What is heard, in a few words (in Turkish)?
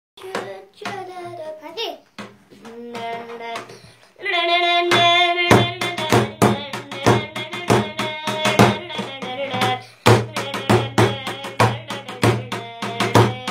davul ve org calan muzisyen cocuklar